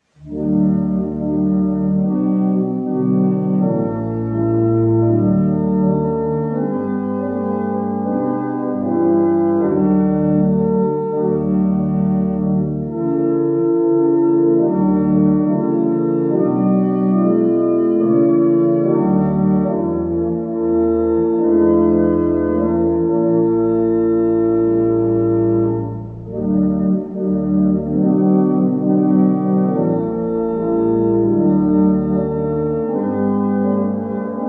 barrel organs; Barnston; Nicaea

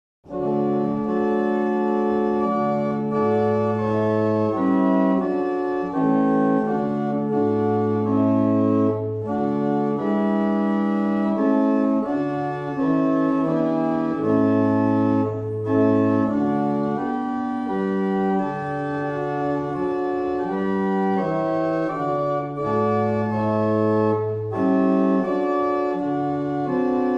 barrel organ; Mattishall

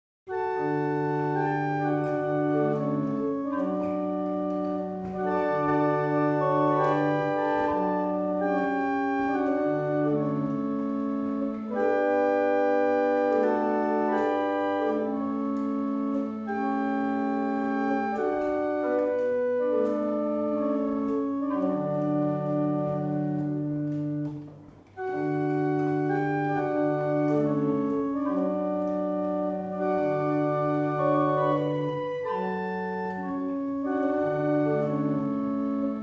Double chant, barrel organ; Winwick; Mornington

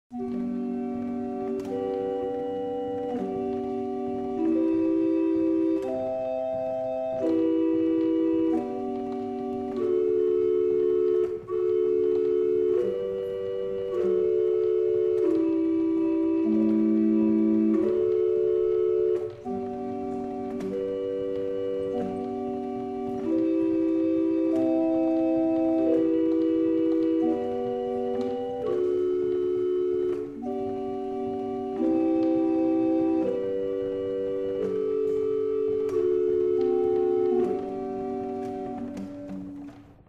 barrel organ; Liddington

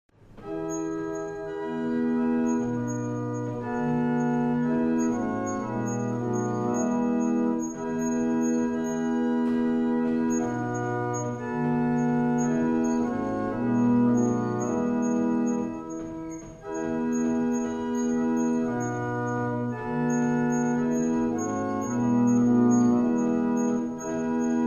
Single chant, barrel organs; Wissington; Chartres cathedral

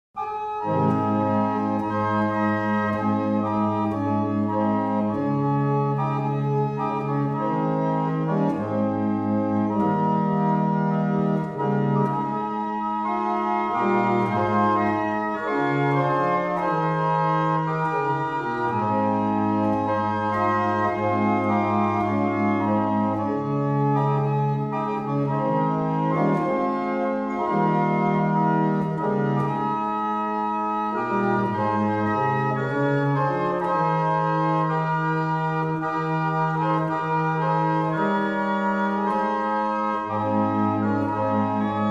barrel organs; Tytherley; Helmsley

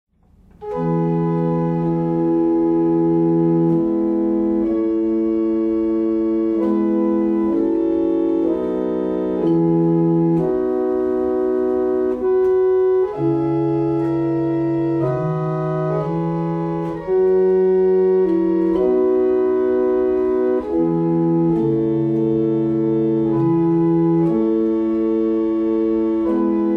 barrel organ; Lillington

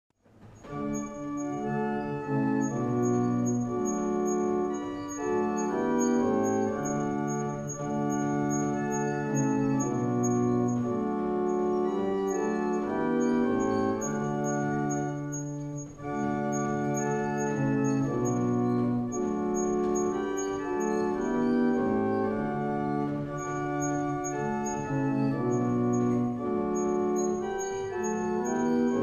Single chant, barrel organs; Wissington

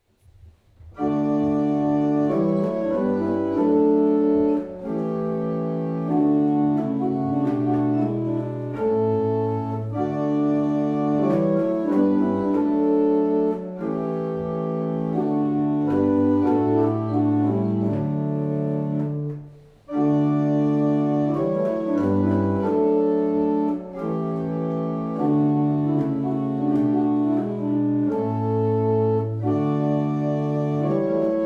Double chant, barrel organs; Steeple

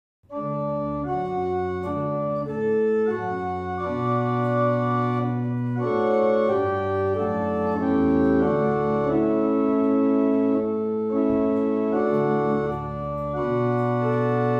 barrel organs; Brightwell

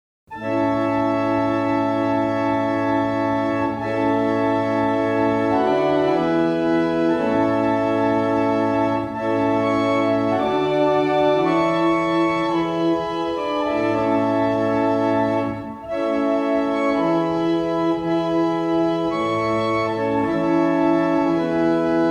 barrel organs; Hampton

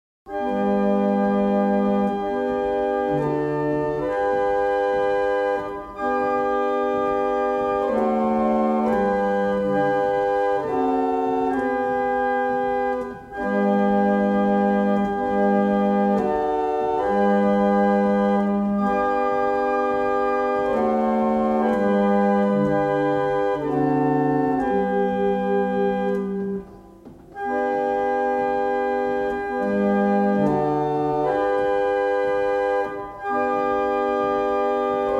Single chant, barrel organs; Wardley; Farrant

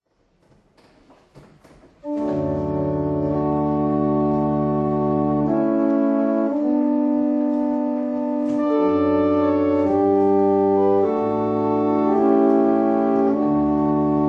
barrel organ; Letheringsett

